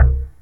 roll; Glasklar Interactive Technology; Technik

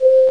Whistle (C4); X-Wing Demo; X-Wing Patches

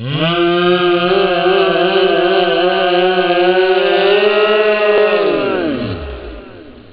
ooooaaaa, speech; OctaMED SoundStudio V1

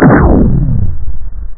Spell Launch; Realmz 4.0

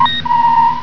Quit; PIZZA™ 1.0.4; Event Sounds

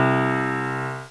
piano soft

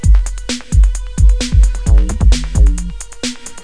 electro; Guitar&Electro

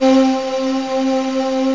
Syn Voice

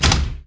door metal close stop; mechanics; Spearhead Demo; MacFormat 2003 June

